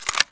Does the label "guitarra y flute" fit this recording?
No